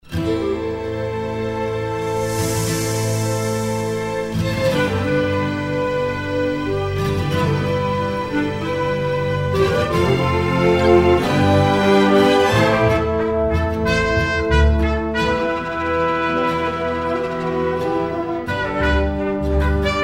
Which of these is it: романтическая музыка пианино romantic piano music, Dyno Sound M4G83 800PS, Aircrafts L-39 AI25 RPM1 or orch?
orch